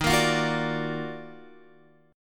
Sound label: Eb Minor Major 9th Guitar Open F; JGuitar